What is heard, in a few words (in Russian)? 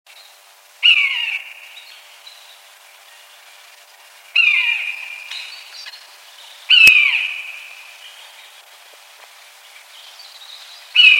kanjuk